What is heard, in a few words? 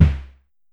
Drum X Snare; Pearl Drum-X